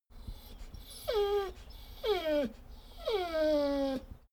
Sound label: dogo argentino whine whimper